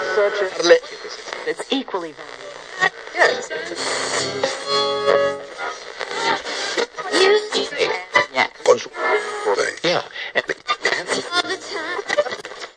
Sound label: tuning yer radio; Radio FM synth type stuff